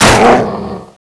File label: meatwall zombie egg crash